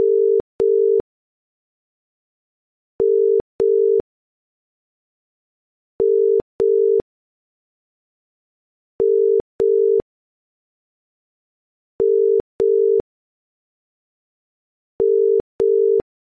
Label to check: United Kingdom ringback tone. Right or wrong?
Right